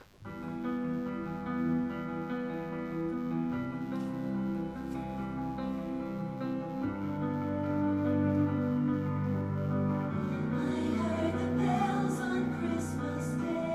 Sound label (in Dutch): Tenor; K13 I HEARD THE BELLS ON CHRISTMAS DAY